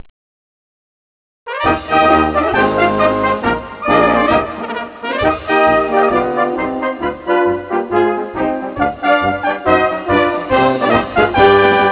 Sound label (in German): Marsch